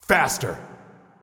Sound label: Activated Rapid Fire Wolf; BTD6